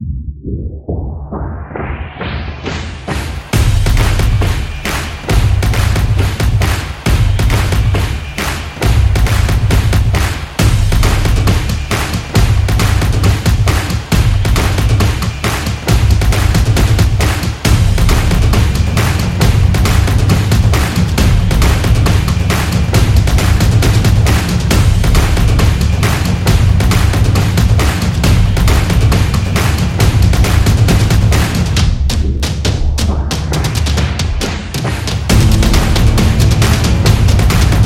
Football Sport, sport drums

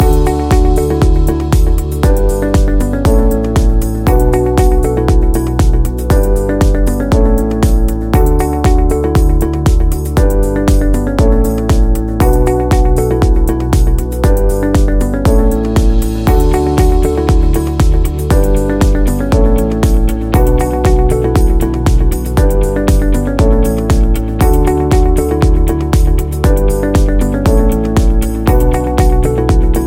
Corporate Loop Positive

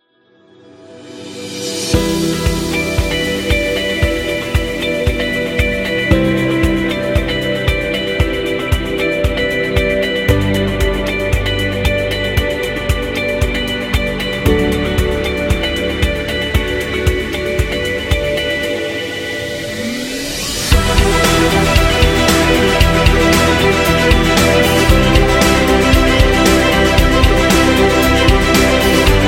Inspiring Corporate

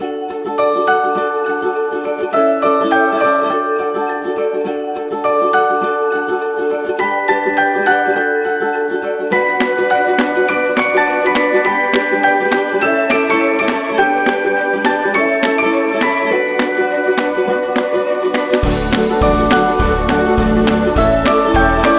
Uplifting Christmas

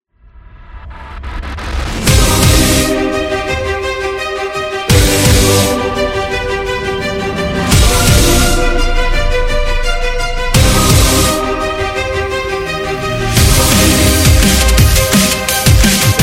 Dramatic Action

